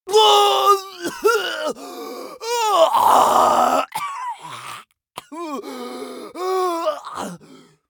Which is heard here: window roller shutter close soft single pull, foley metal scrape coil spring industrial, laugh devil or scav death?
scav death